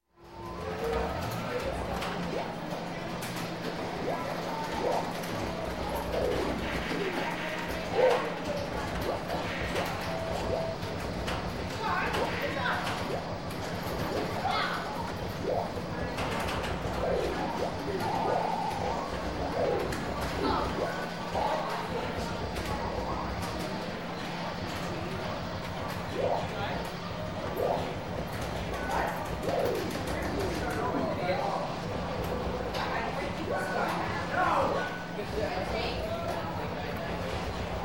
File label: Arcade Small, Video And Pinball Machines; Sound Ideas 2000 Series